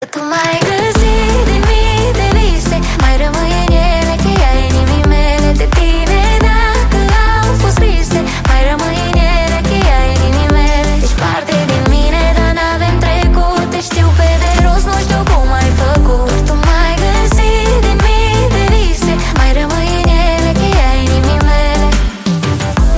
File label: ringtone